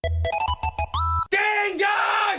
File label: Ding dong